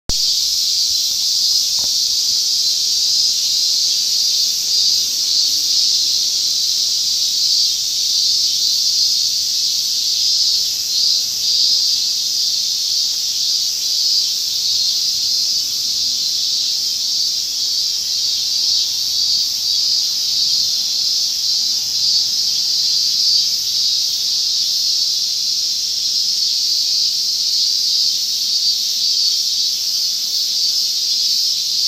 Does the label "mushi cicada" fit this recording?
Yes